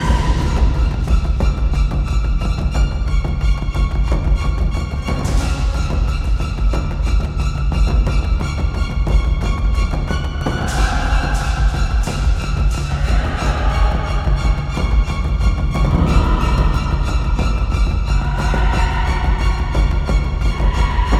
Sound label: Action, Loop, 180BPM; Dead Ark